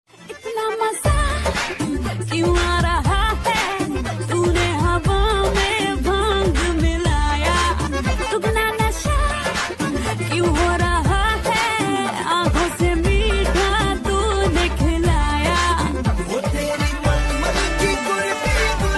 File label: Romantic Hindi Holi Ringtone; ringtones